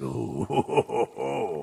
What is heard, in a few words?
Creepy laugh